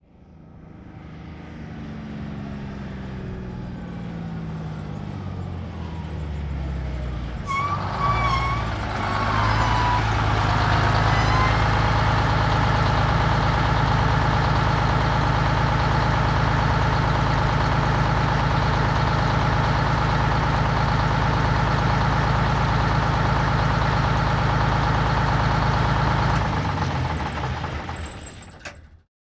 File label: 1948 Diesel Truck Ext In Medium Stop With Brakes Idle Off, Period Vehicles; Sony Pictures Sound Effects Series Volumes 1-10; Sony Creative Software